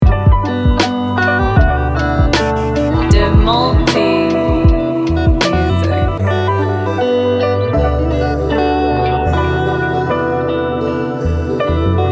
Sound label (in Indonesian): lofi, petikan gitar elektrik